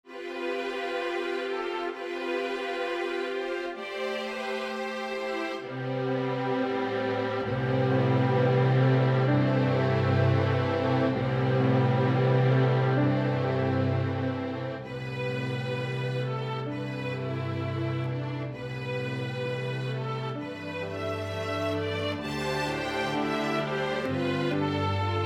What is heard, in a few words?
Theme (Strings Piano)